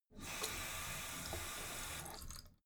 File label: tap flow light short, badezimmer